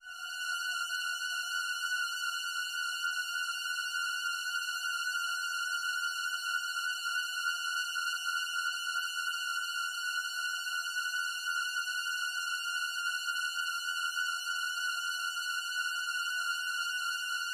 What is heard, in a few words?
Just a Few High Tension Notes